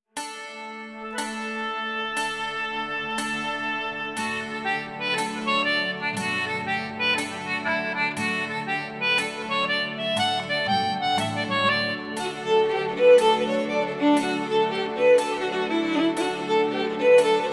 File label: irish grass